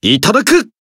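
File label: Voice attack, Japanese; zeviro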